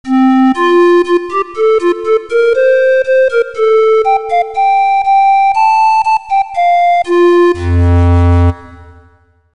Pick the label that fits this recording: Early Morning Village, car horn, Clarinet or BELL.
Clarinet